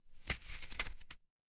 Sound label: paper fabric page turn